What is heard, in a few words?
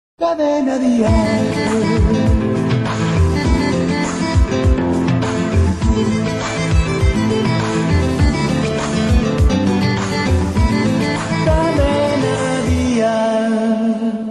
jingle; cadena dial